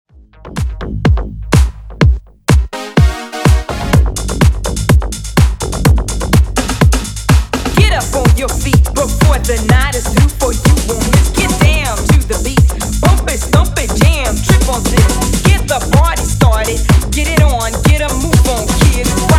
125Bpm

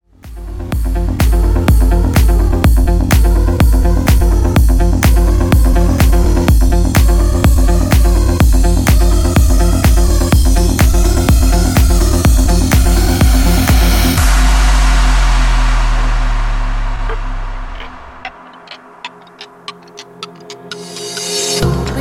Epic Mashup 125 Bpm; djyan

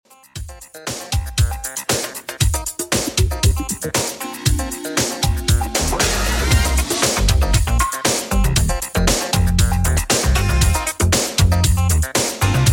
80s Redrum 117bpm

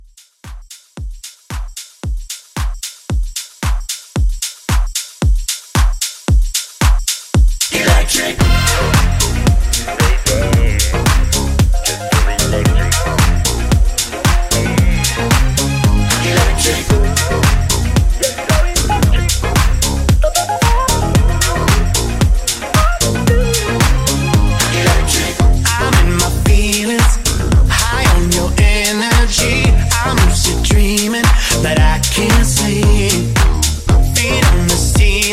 Re Drum 113

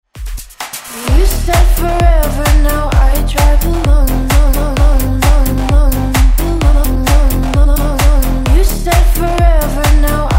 edm

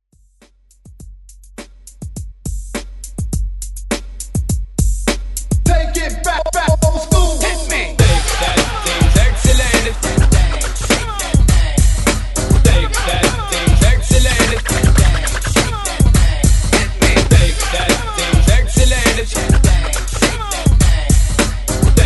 Old School Party Break

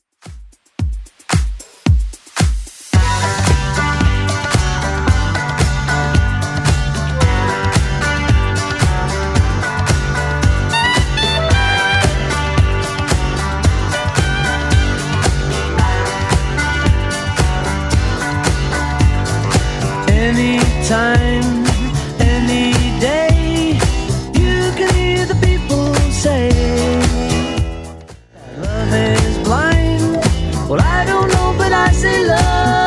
70s Club Edit 112bpm

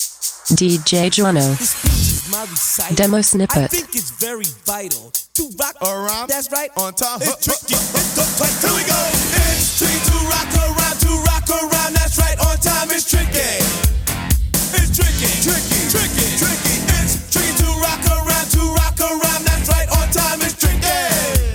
128 bpm Eb

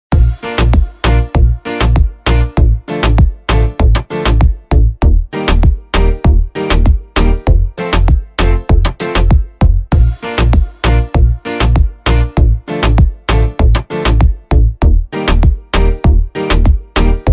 98Bpm

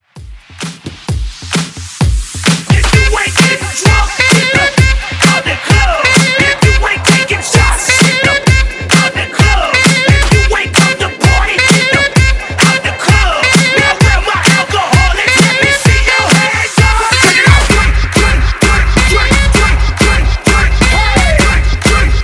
Hype Intro 130 BPM; Mash Up; Bootleg

